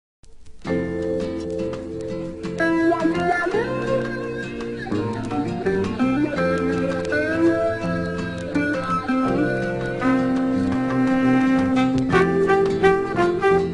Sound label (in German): fahrstuhlmusik